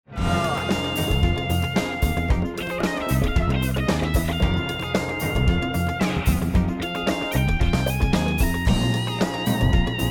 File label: lost round; music kits custom